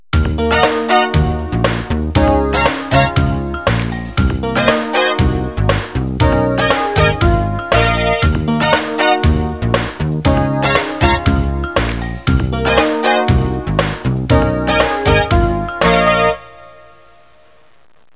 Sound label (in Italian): suonerie businessy